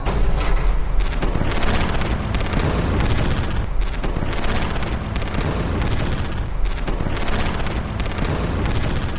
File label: battlefield; RPG Maker; Wartools v1.14